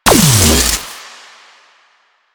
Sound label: Antimatter Drop Fully Charged; Warframe; Warframes